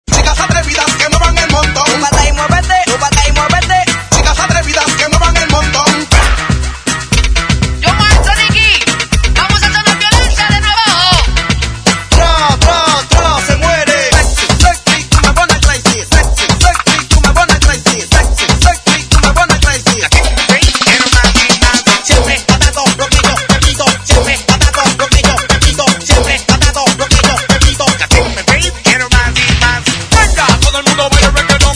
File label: REGGAETON